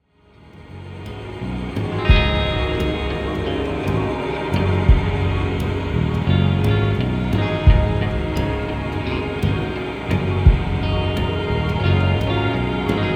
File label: slow grooves